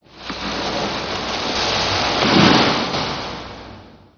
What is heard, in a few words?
Woods - Mysterious Sounds